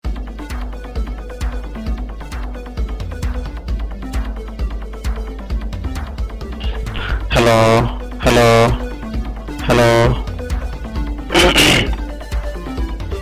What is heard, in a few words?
test tone ringtone